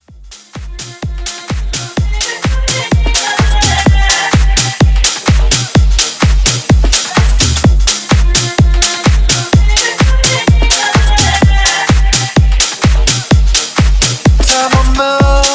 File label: House Re Drum Up Beat 127 BPM